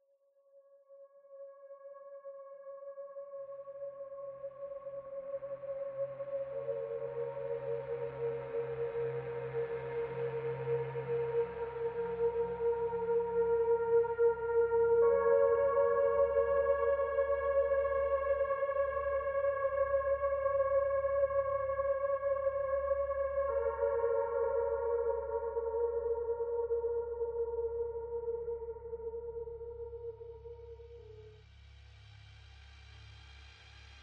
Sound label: ambient